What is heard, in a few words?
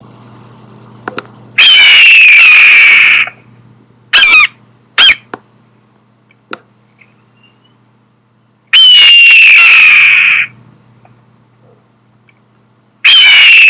Red tailed hawk